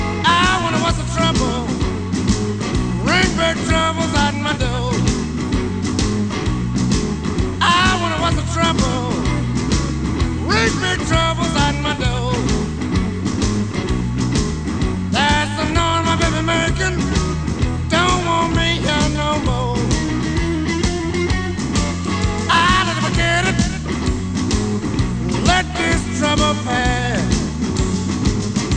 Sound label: blues